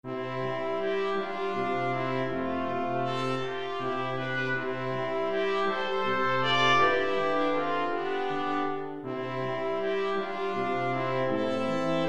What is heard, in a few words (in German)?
blechblasquintett